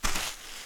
walk; cheesy-pirate; sailingWithSharks

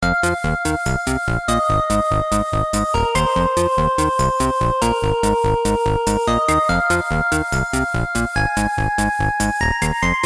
menu music

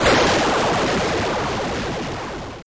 lose; nerf-ultimate-party

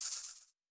rattle

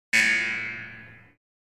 lose; nerf; vortexPyragon

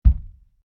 thump

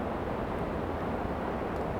hi speed loop; earth-to-echo

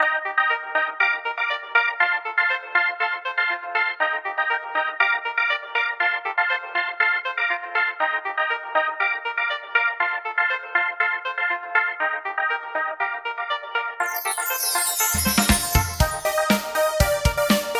music; myLittlePony

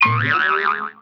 beach ball; cheesy-themepark; dontWhackThePack

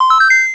collect Pack; cheesy-outback-adventure; surfin

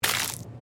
splat

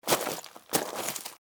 damage

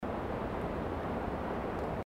hi speed loop; earth-to-echo

